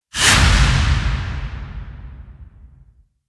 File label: Hit Swell; Ninja Tracks